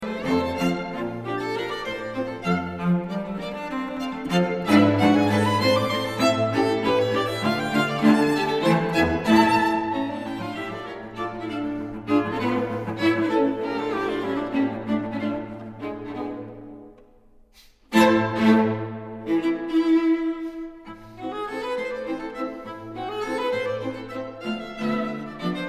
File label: String Quartet No.1 In D Minor III; Moniuszko